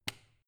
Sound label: switch turn on hard